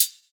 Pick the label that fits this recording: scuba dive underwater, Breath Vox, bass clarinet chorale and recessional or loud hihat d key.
loud hihat d key